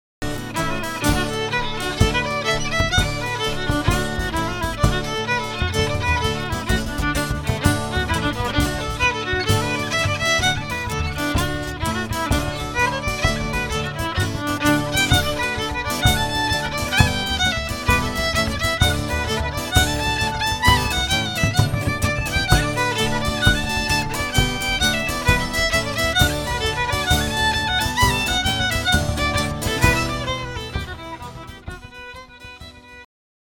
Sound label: jigs; The Rose in the Heather